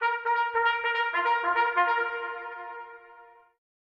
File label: trumpet